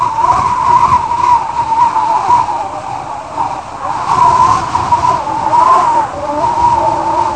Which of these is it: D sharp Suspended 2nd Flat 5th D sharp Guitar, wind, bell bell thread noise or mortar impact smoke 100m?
wind